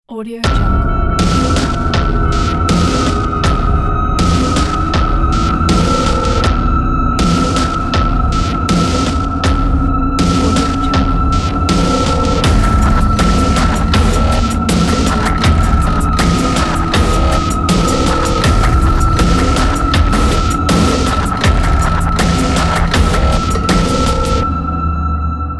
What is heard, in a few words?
dark cinamatic logo